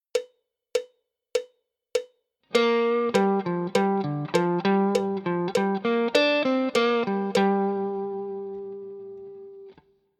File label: G minor pent lick, guitar